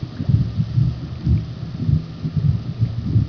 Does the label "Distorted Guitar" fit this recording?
No